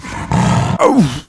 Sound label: pain, dragon; player